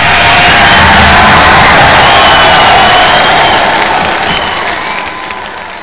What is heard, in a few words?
GOAL; quake; arena